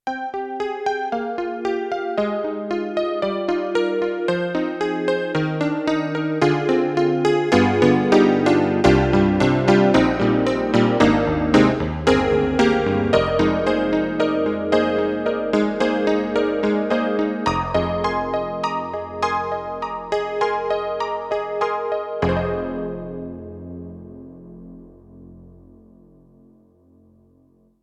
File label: YAMAHA MU90 Detuned CP80